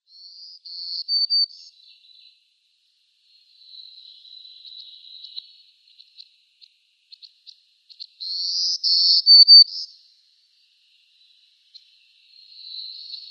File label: Black-throated Green Warbler song